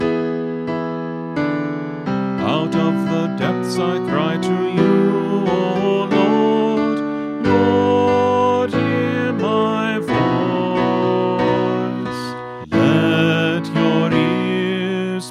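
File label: Psalm, Choir Practice Tracks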